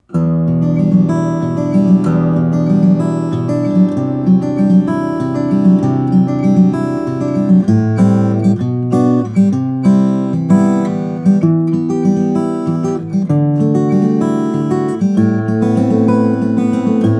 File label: Taylor 914-C, steel-string guitars, flattop